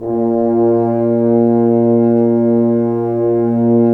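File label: french horn, brass; Roland - LA Composer vol. 1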